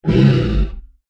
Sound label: Dinosaur Snarl, Creature; Animal; Dinosaurs (Sound Effects:Animals)